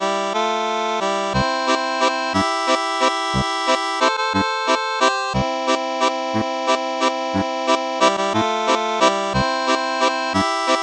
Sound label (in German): Walzer, steirische Harmonika